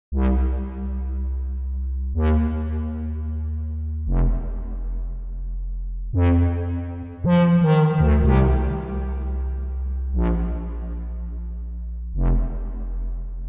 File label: bass